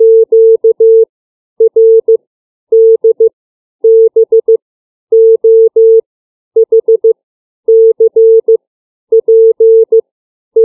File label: CW alphabet